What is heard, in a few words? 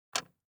DICE on Glass, Grab, Standard, 6 Six Dice; Samples; ArticulatedSounds; DICE Sfx Party (Extended)